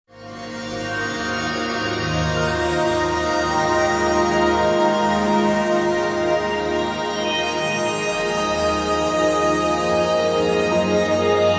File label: new age adventure, pads